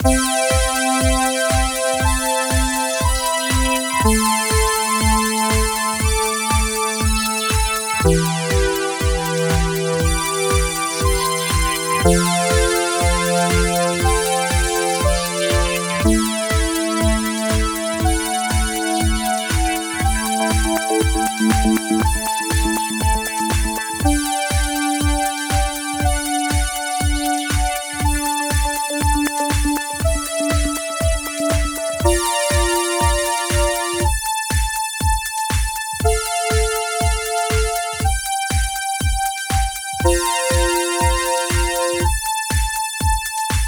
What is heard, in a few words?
Proteus Pad